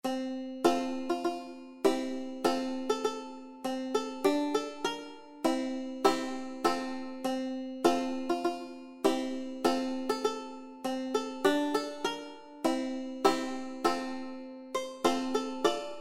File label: ukulele